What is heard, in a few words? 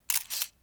camera shot; soundmorph